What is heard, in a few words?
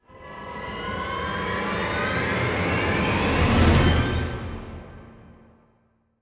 Investigation Transition Calm To Tense; Toussaint